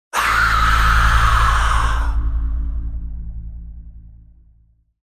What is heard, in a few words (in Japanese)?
zonbi